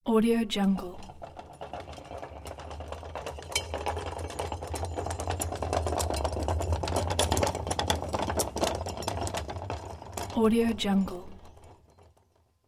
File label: supermarket